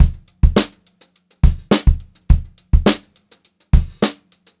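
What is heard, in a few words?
104 straight variation, Funk Groove Drumloops; USB Soundscan vol.38; AKAI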